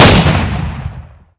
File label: win1200, shotgun; weapons; ModernWarfare